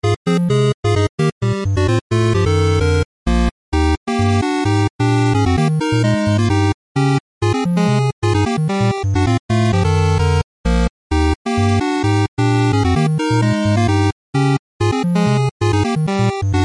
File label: jingle bells jingle bells christmas